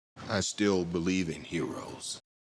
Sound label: RingTone; Superbowl